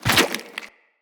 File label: Land Goo, Footsteps; Characters; Tenno